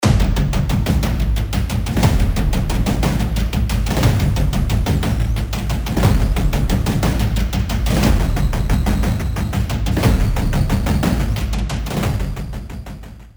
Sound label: Loop Tight Big Knob Modulation; Cerberus